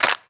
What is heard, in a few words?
Pre-Shifted Claps; Vengeance Samples